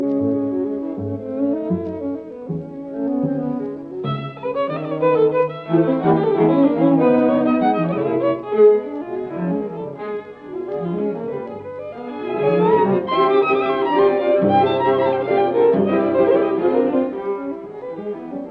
String Quartet No 5 BB 110 III Scherzo Alla bulgarese Vivace; Bartok